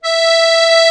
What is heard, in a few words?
HOHNER, akkordeon